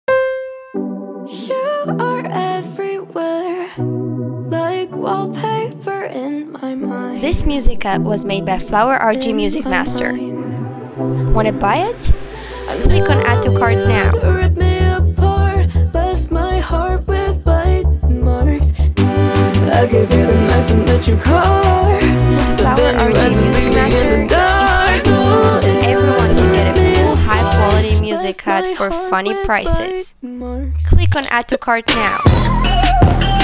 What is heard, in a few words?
Bite Marks music rhythmic gymnastics